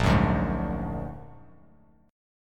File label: A sharp Augmented 7th A sharp Bass Standard; A#+7 strummed; JGuitar